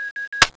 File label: reload in, weapons; tfa cso